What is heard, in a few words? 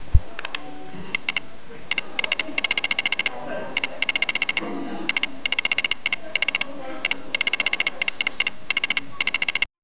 sound of calculator buttons